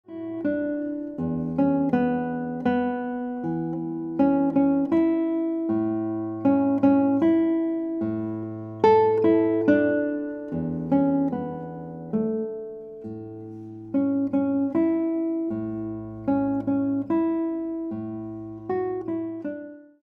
Guitar

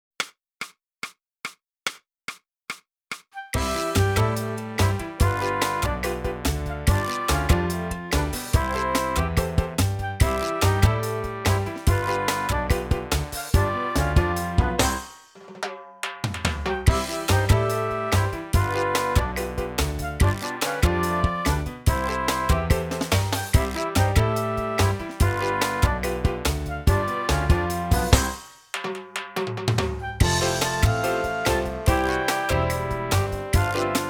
sax

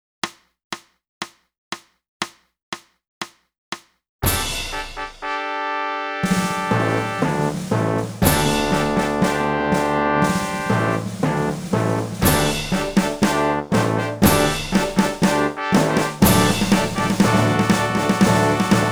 sax

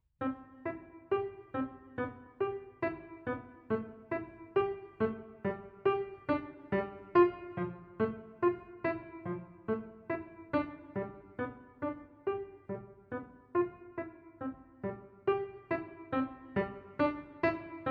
piano

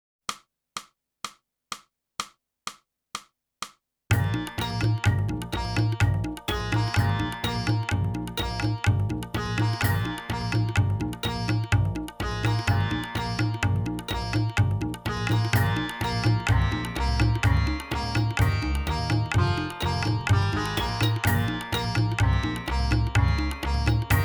sax, tiger leap

